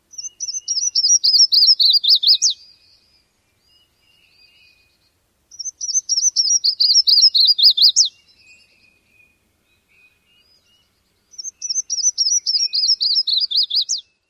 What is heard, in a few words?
songs, YETHWA